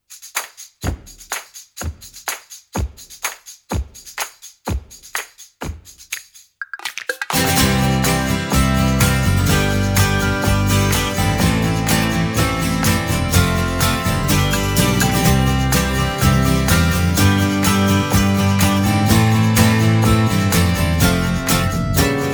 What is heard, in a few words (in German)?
Karaoke